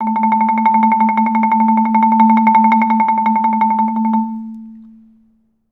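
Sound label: MR TREM A3, TREMOLO; Best Service; Peter Siedlaczek's Advanced Orchestra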